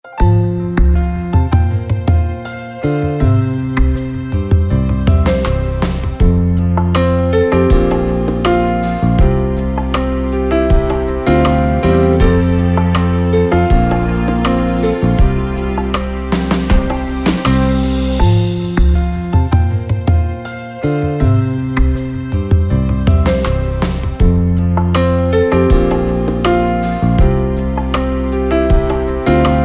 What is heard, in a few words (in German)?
telefonansagen musik